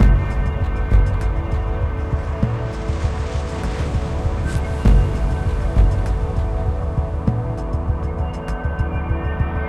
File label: Tension; Mirror's Edge (2008)